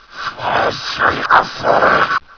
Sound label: zombie alert; zombie barney